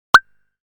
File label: Wasp-Drum